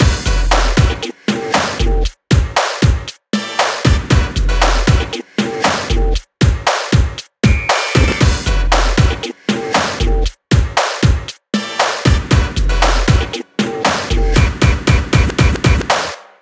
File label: How To Play Loop, Music; TMNT